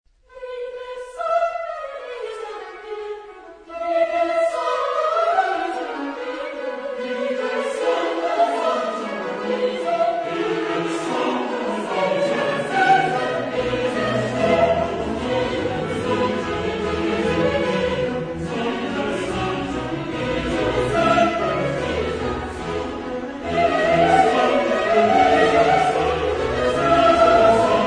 Cantata, chorus; Bach; BWV208; Lebe Sonne dieser Erden

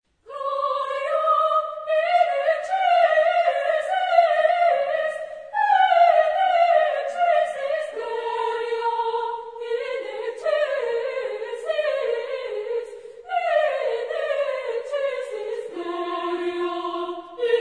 Messe a Trois Voix Gloria, chorus; Caplet

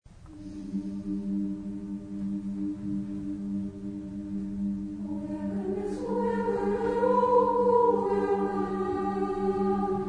Pieta (female), chorus; Magi

